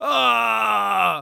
Human Male Groan In Agony Short

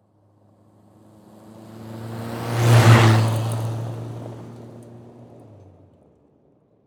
Auto Saturn Drive By Fast Dirt Road; Transportation

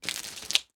Liquid Water Liquid Splatter

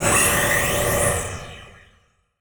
Transition Whoosh Fire; Production Element

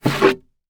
Foley Wood Scrape Wood

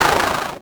Title Transition Chopper; Production Element Title Transition

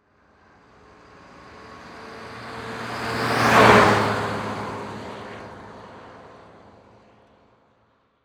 Truck Ford Ranger Drive By Fast; transportation

